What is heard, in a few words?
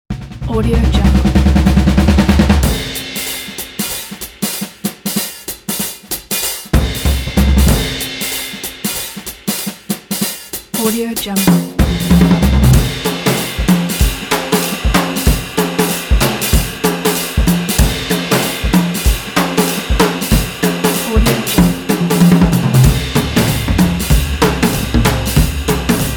jazz drums swing; nikita-kit